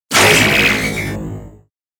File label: lazer bird special; Angry Birds Space